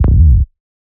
Slide F, Bass

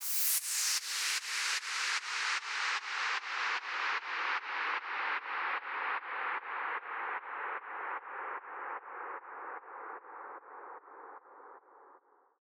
SWEEP DOWN

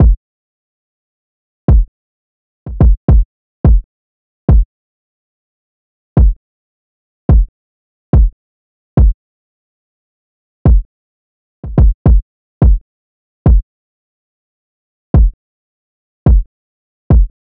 Drum Loop 107 BPM Kick; Loop Stems; Cymatics; Vibes II Drum Loop Collection; Voyage

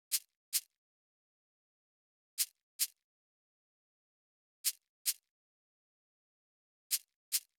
Drum Loop - 106 BPM Shaker, Afrobeats; PARADOX II - Drum Loop Collection; Cymatics; Tribute Drum Loop

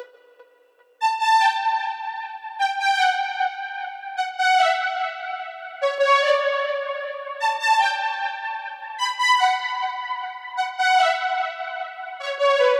150 BPM B Min MemoryMoog Strings; Synth; Loop Stems; Cymatics; Fantasy Synth Sample Pack; Universal

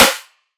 Snare; Cymatics; Future Bass Starter Pack; Drums - One Shots; Heater

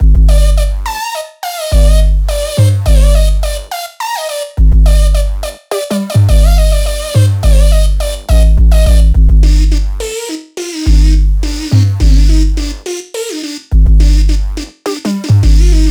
Sphinx - 105 BPM G Min, Melodies

